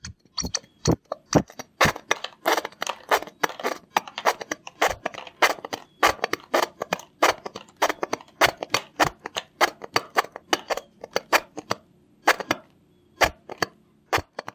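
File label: trying to pour out the last drop from a bottle of hand sanitizer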